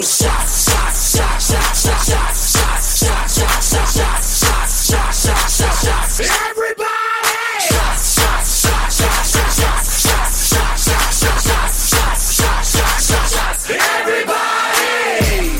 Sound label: ringtone